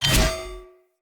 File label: Cleaver Heavy Whoosh L to R, Swords; Weapons; Player; Bromius Cleaver